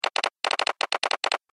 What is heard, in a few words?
Telegraph Sounder, beep; Telegram; Beep Sound Effects